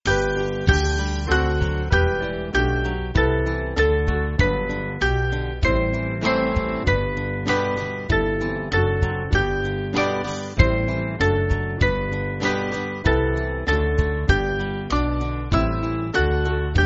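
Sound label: Band, church backing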